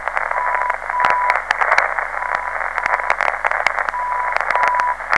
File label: alpha 14.9kHz